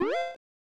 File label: Coin Toss